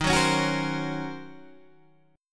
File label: D sharp Minor 13th D sharp, chord